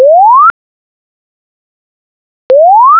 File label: chirp